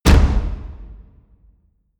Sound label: percussion